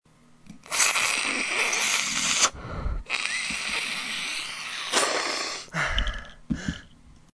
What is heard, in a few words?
snort